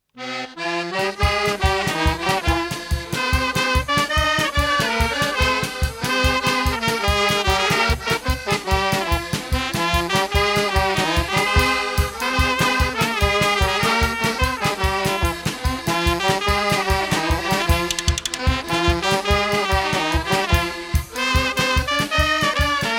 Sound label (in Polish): Polka